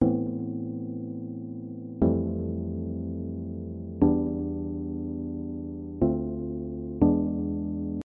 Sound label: Chord Progression Example A Min9 Fmaj7 Emin7 Dmin7 E7